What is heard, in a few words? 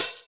Hhat, Hihat; Equipped Music; Loungin House